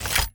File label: ui ask information